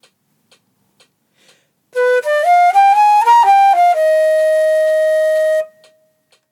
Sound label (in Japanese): G7 ドミナント7th